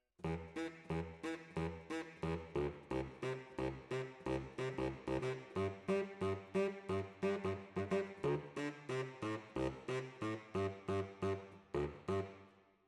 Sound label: Tenor, RNR for piano